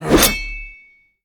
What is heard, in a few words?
Axe Attack; We Are the Dwarves